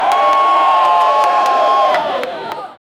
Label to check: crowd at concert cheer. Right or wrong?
Right